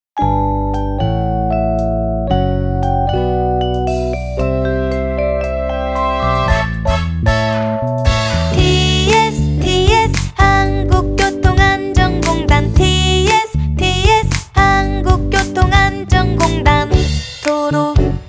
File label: vocal, 로고송